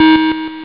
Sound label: Collect; Classic800XLPro